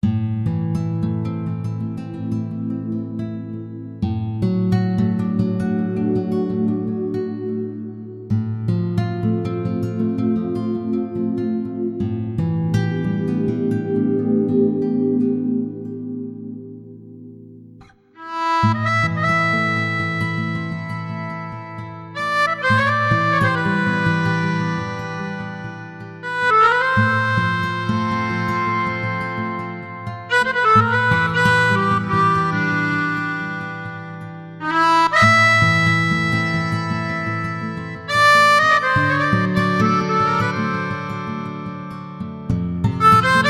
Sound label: Harmonica Ver.; Kyosuke Kiryu